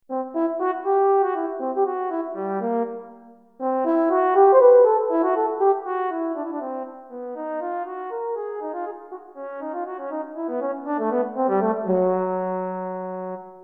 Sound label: French Horn; sibelius sounds